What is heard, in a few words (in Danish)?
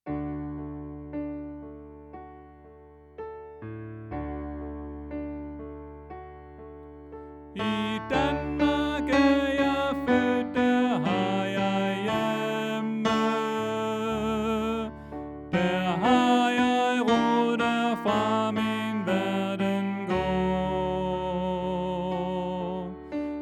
Tenor